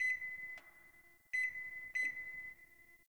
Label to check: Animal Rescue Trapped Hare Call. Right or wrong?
Wrong